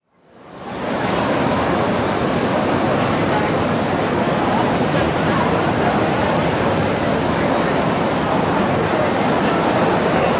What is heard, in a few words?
Crowd Outdoor Heavy City, Walla; Sound Ideas; Walla Series